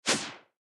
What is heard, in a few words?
Warping